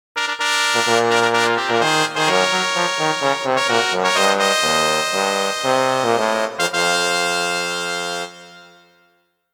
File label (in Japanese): トロンボーン付きのファンファーレ; monster farm 1 2 complete sound collection